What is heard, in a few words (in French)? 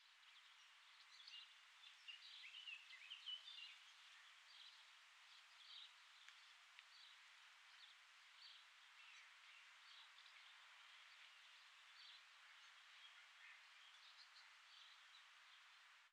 Arbre Lierre Oiseau Pépillement, Friche Industrielle Rhinau